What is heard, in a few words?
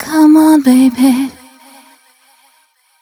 f mel c, fem Vocals; Mutekki Media - Diamond Vocals 2